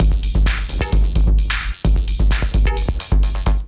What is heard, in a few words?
Salient Kit groove 130 bpm; MIDI Clips; 122 - 130 bpm; Glitch and Wash; Ableton